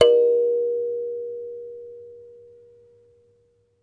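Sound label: KALIMBA C5; Synclavier Percussion; World & Orchestral; ILIO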